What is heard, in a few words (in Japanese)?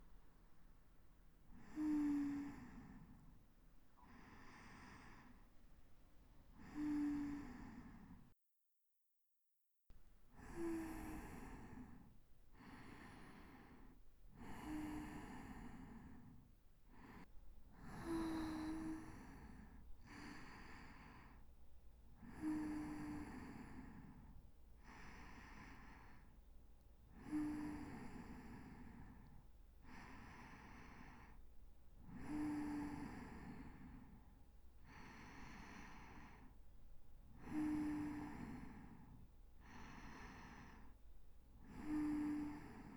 添い寝 セリフ無し